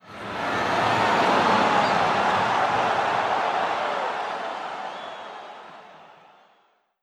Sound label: sigh